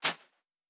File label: Footstep Sand Running; Character Sounds